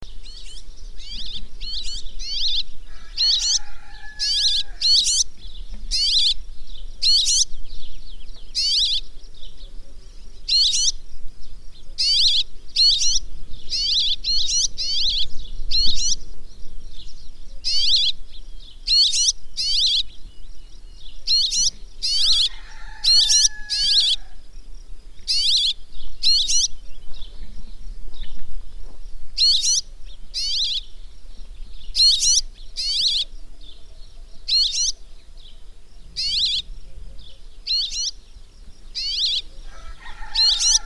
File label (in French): Moineau soulcie Petronia petronia